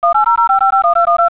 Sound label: touchtone